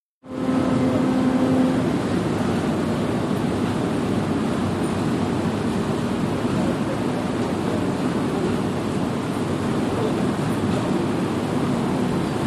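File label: Ferry Depart Leave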